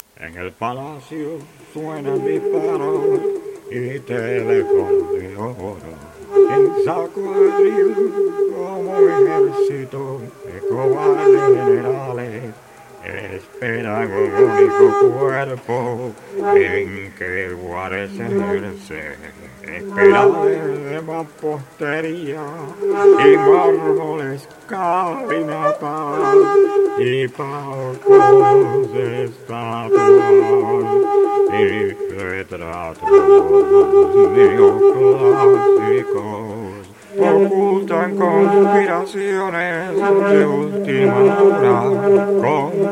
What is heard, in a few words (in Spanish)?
Fuga Saxo